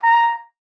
trumpet a#5, Trumpet stacc forte; Session Instruments

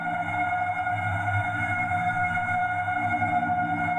Tonal Re Draw, sparse soundscape

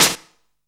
NOISE, SNARES; Zero-G - Funky Elements

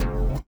LIQUITAR; Spectrasonics; Metamorphosis

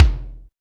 BONUS BD, Drums; Best Service; Real Mega Drums VOL-1

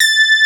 SB HORN; Wizoo - Powered Nord; STEREO LAYER

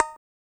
RSS PERC

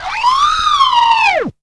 SIREN; Zero-G

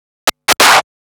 Scratch Fills 140BPM; Vengeance - Essential Clubsounds Vol.4; Scratches

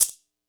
kit, Yamaha Motif-ES8

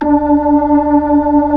B-3, Keyboards of The 60's and 70's; Club Xfade

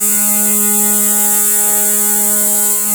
SPACE G#2, CLAVI

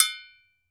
TOKE BEL, African Drum Percussion; E-MU Producer Series Vol. 7 – Old World Instruments

